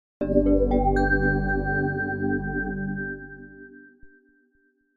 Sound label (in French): synthe; Logos